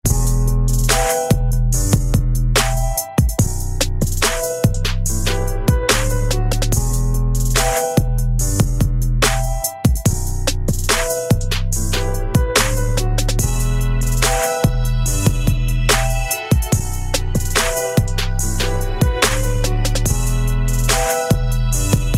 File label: hip hop